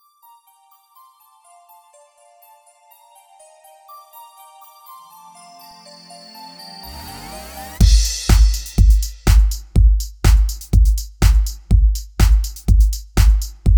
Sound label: Rhythm (123 BPM All Stems Assembled); Yum Yum Cookstar